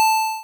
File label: pickup, 8-Bit Sounds